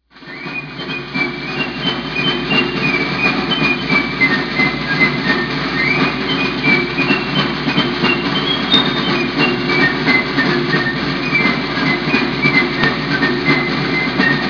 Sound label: fife drum